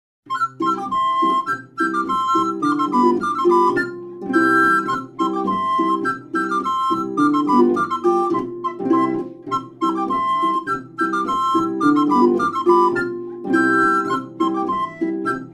Spielstuecke und Polka

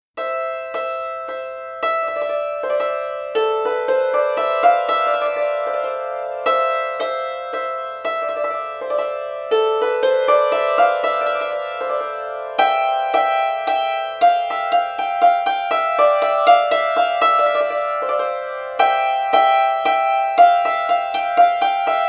Hackbrett Sarabant

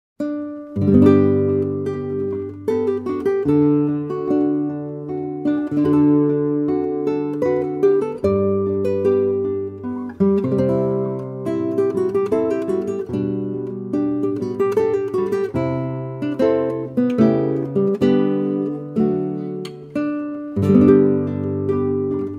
Gitarrenmusik Menuett

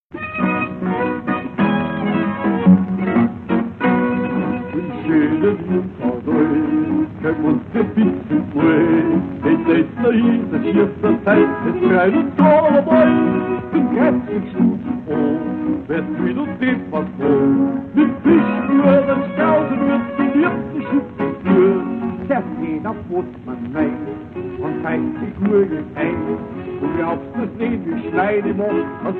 Toelzer Schuetzenmarsch; Kiem Pauli

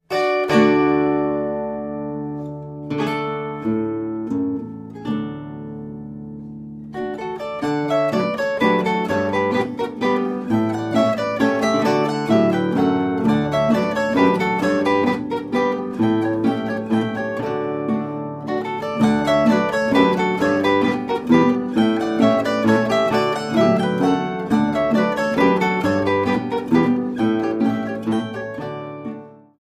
Wiener Laendler

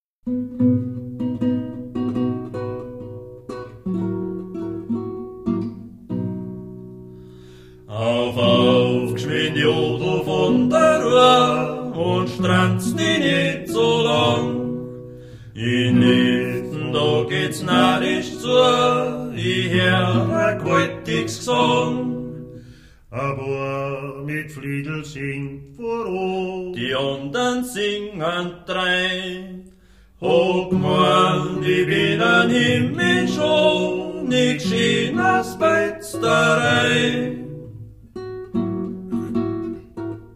Volksmusik Chiemgau Hirtenlied

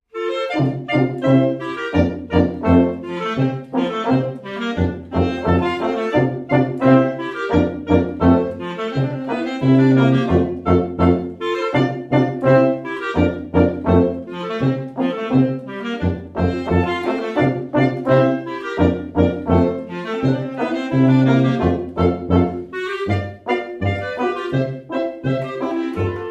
Schottisch; Die Kuah

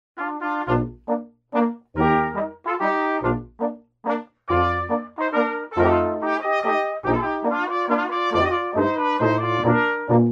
Gebirgslieder, Zwoelf Laendler; Halbreiter

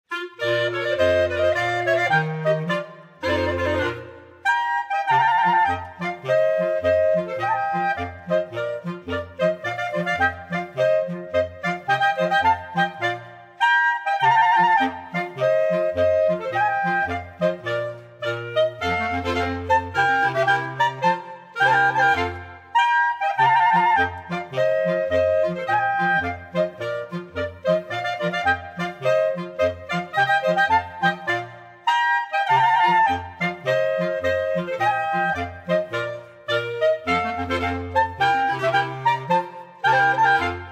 Holzblaeserquartett Marsch

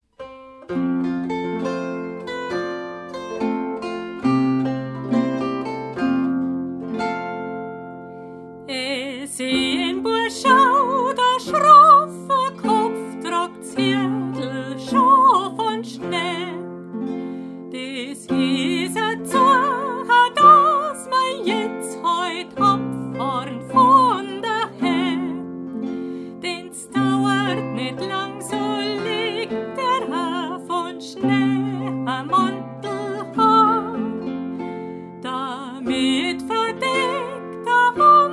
Gebirgslieder; Halbreiter

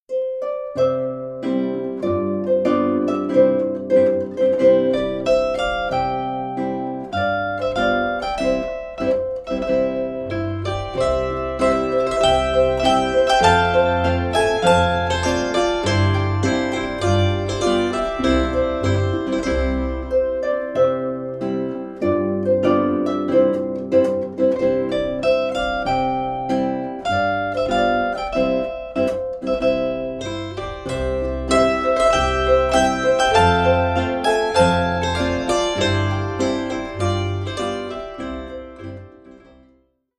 Spielmusik Saitenquintett

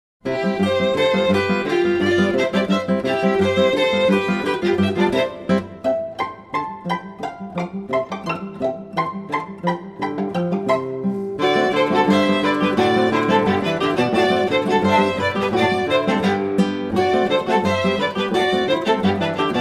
Dreher

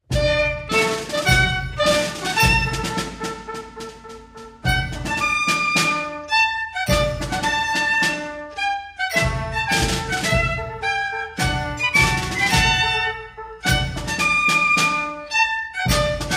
Aufzugsmarsch; Stelzentanz

